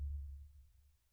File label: sonar Tail Air Medium Beacon Directional; Barotrauma; Real Sonar